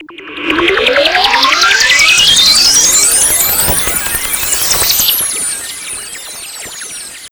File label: FX SWEEP, SYNTHSWEEPS